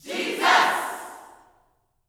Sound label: JESUS, Gospel, YELL SHOUT; Spectrasonics; Vocal Planet